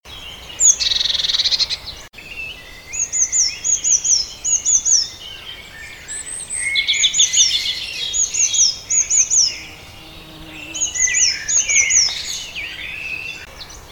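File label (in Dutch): pimpelmees roep, blue tit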